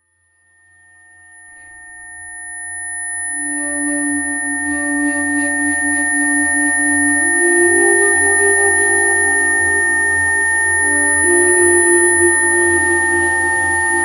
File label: Eye Chakra Cleansing Frequencies